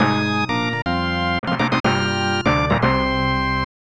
Stage Clear; Super CastleVania IV

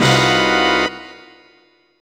Hit; Banjo Kazooie

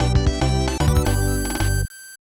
Stage Clear; DK Country 2

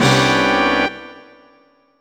Hit; Banjo Kazooie

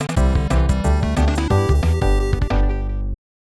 Stage Clear (Rocket); DK Country 3

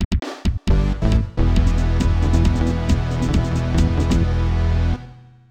Gyrocopter - Landing Accomplished; Pilotwings 64

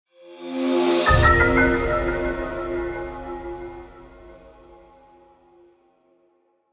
Get Fanfare (Sheikah Slate); Legend of Zelda Series; Breath of the Wild [Wii U & SWITCH]

